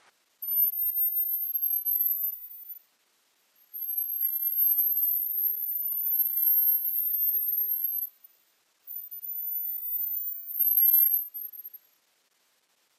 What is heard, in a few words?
Northern Grasshopper Mouse